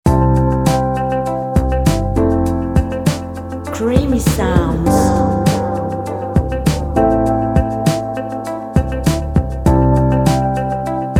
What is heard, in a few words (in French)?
creation musicale groove soft